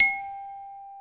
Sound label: G3 glock; InVision Interactive; Lightware VOL-1; Instruments & Percussions; GLOCKENSPIEL